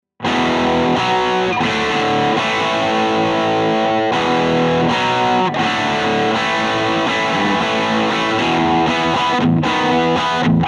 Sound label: rock chords; jensentone